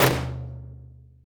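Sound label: MECHANISM, Impacts, Hits & Booms; Distored Reality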